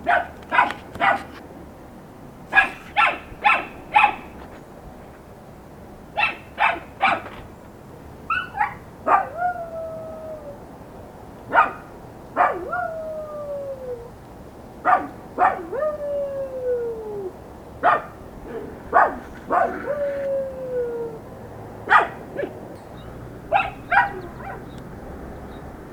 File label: dog howls